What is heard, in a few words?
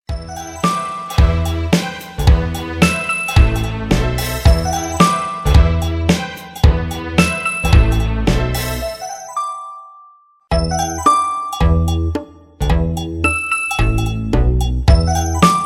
Surround 3d Nokia